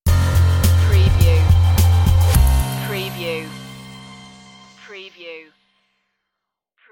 floating stinger

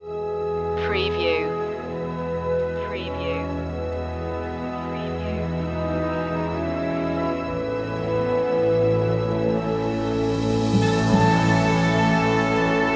olympic glory intro fanfare

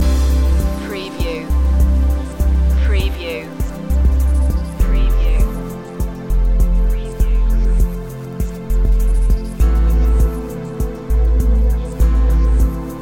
ocean breeze loop

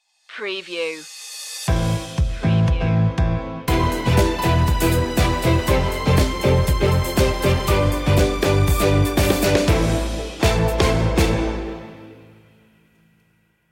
happy to be here stinger

